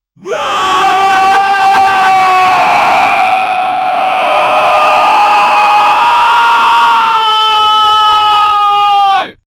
shouting; hulk

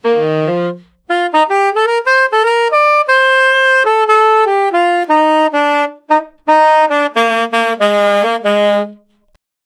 saxophone solo upbeat

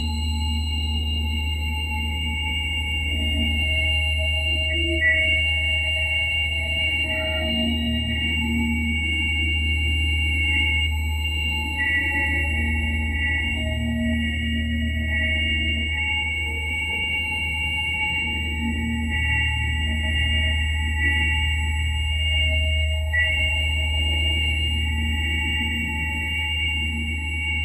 harmonic magic resonance, building intensity

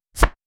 card shuffle stylized; gameplay

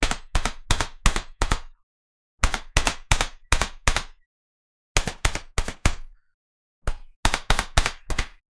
bow shooting; bow and arrow

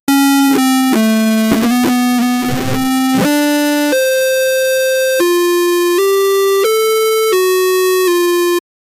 8 bit game victory sound

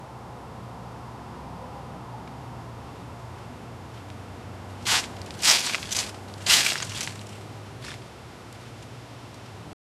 cold foggy outdoor ambien; neighborhood

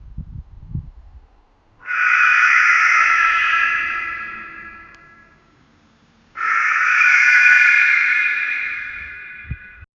scarecrow sounds

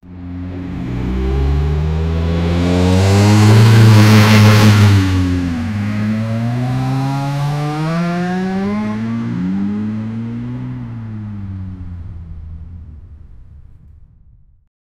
sound of a porsche sports, cars

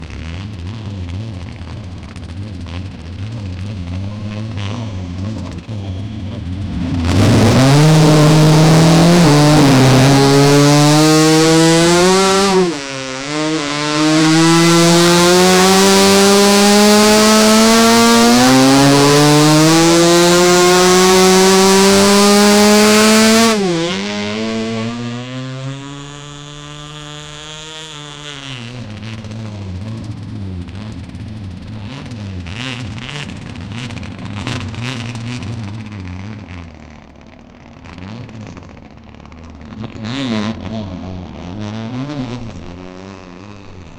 a race motorbike with v4; motorbikes

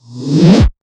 gravity change the player

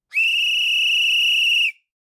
whistle

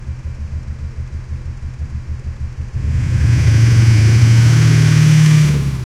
car engine revving violen

